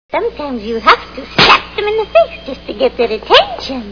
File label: slap